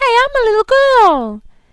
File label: death